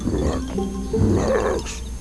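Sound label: snorlax, Pokemon crys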